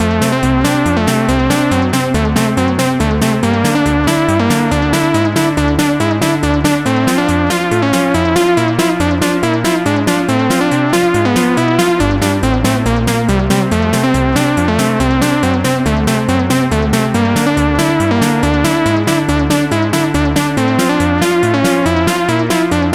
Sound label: Chiptune 8 bit